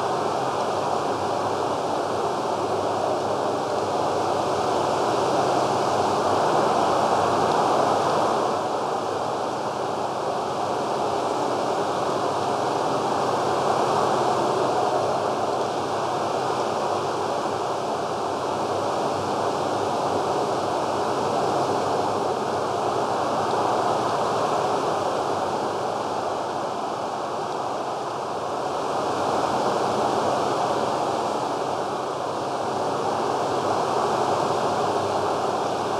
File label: ambience cemetary higher; Aragami